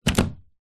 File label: household night light plug in socket